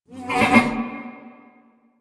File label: Ram Idle Grunt; Duviri; Animals